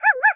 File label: Meow